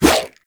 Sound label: Sword Swipe; Warframe; Pacifist Sword And Board; Weapons Melee; Whooshes